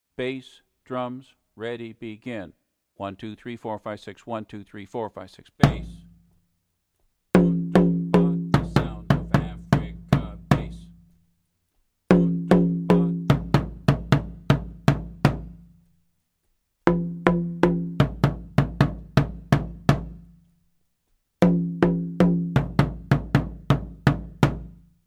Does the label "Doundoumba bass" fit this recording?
Yes